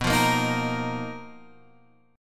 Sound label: B Major 7th Flat 5th B down Guitar Open G